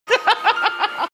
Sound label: laughing